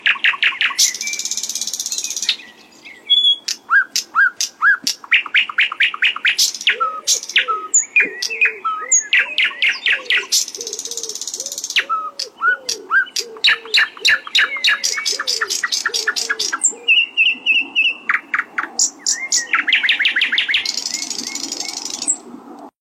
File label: ringtone